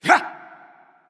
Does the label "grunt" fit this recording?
Yes